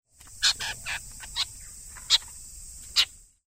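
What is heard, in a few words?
bupposo, Oriental Dollarbird; kibi; koe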